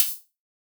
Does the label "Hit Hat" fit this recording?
Yes